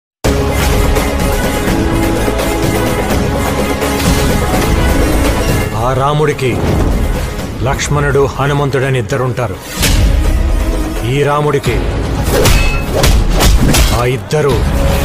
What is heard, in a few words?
telugu movie bgm; Ramabanam movie